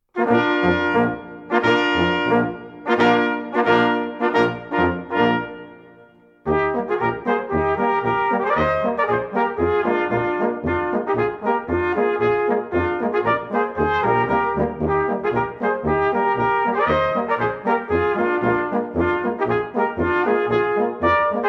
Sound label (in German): Feu´wehr Polka; Lachstätter